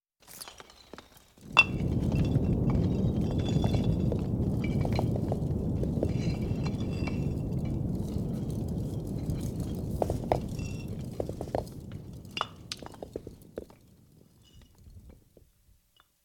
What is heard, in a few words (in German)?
erdrutsch; Katastrophen